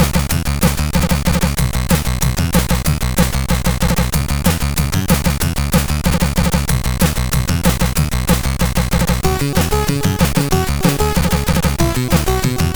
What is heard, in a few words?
Rock Song, sid CSG8580R5; Signor